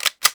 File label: reload mp5; weapons; Max Payne